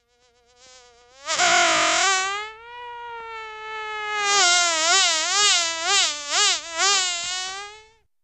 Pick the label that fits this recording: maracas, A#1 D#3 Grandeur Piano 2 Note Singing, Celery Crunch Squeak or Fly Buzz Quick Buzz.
Fly Buzz Quick Buzz